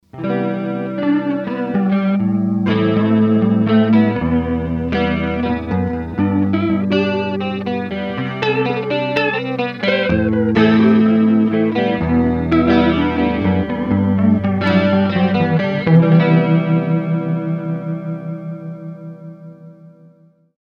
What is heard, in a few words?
DOD 656 Chorus; circuit demos; factory